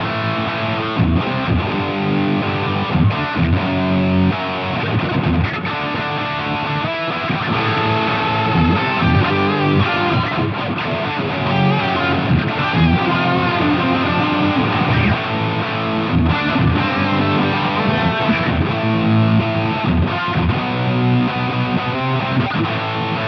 Mooer GE300 Lite Marshall JCM800

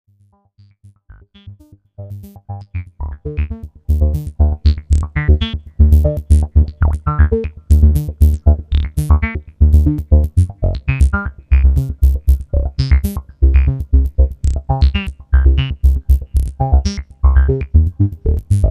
sh5 lowpassfilter

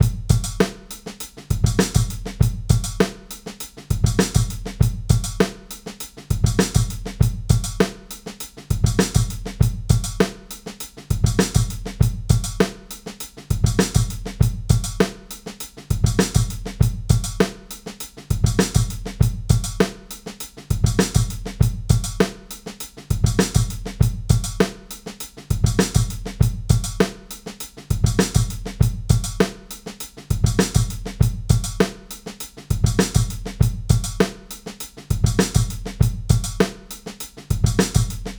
Studio Kit Break Original

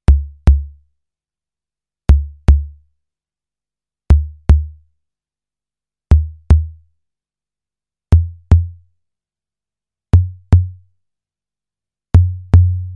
TB2 CONGA

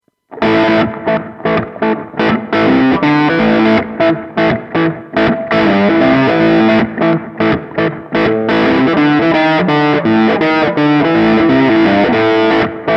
Hotone Ampero One Crunch

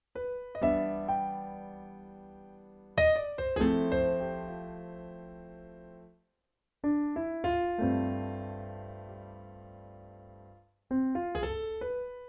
Roland BK3 Piano

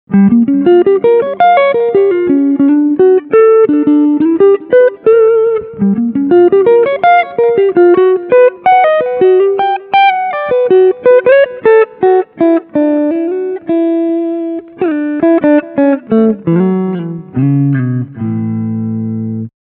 Melody Line Front pickup

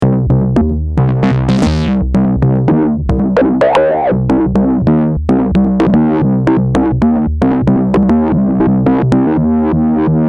ms20mini bass

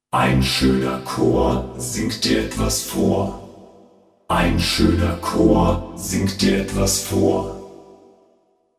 VP550 Gospel

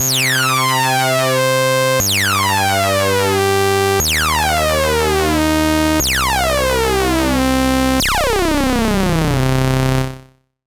Osc Sync

